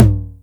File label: tr 808 electronic tom sample g key